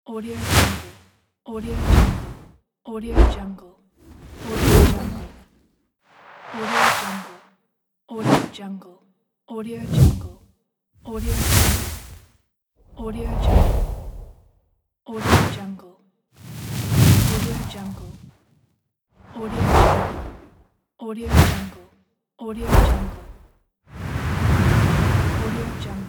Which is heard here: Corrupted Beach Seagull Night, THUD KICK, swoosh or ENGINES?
swoosh